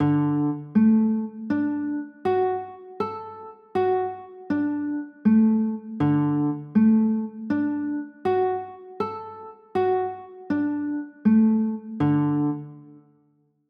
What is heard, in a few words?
d maj, chords